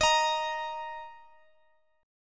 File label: D sharp 5th; D#5; strummed; x,x,x,x,16,18; chord shape